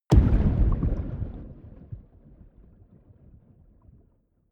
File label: underwater low